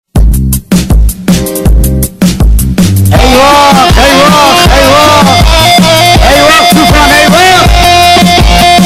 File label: remix bass boosted